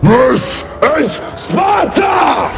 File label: particle suck, ambience; svencoop; cracklife